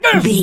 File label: voice male attack